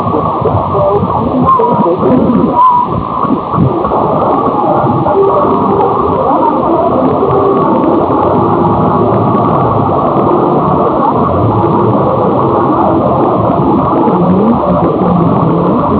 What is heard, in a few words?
612 aus, AM; Grayland Beach State Park, WA; Perseus & various antennas